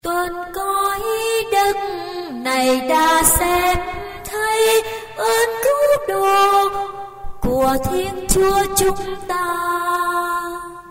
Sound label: sop alto